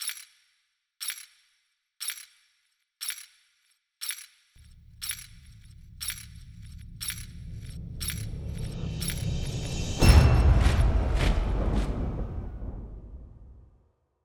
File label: wave out timer